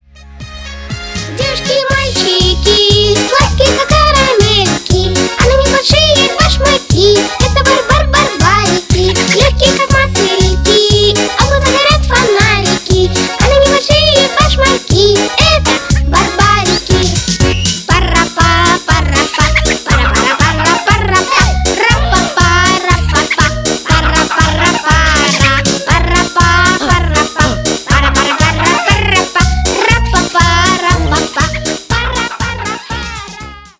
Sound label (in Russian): detskij zvonok